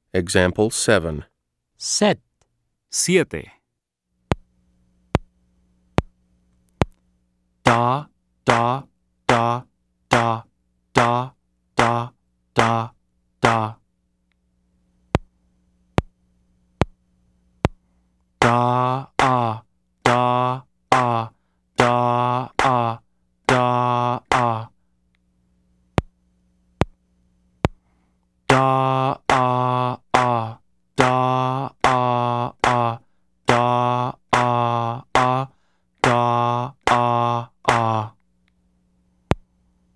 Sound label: Keyboard